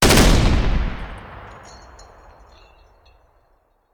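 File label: Artillery Cannon Anti Aircraft; Sound Ideas; Guns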